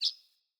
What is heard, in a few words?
Bird Single Chirp; Pothia Bird Guide